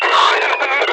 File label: Enemy Death; Warframe; Lasrian; Battle Barks